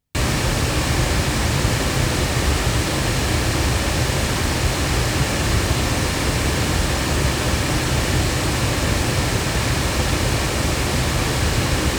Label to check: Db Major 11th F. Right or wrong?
Wrong